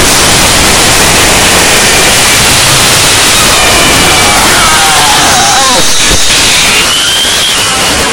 jumpscare sound

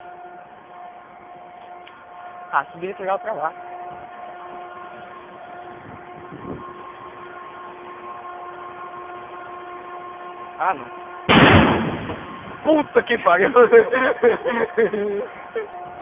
bomba

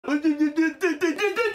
notification

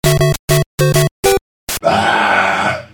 burp

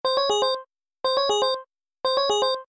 low battery powering off

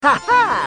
ha ha; luigi

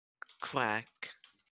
quack; philip

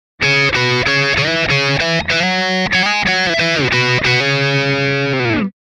guitar bridge; opm

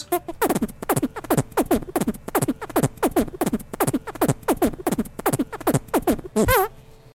long hand farts

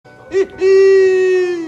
hihi

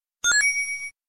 kawaii sound

